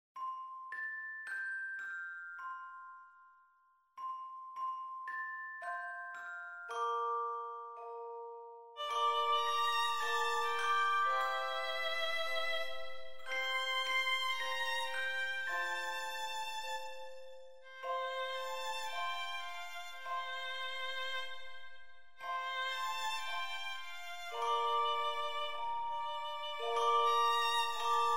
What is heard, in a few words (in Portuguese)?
Jingle Bells violino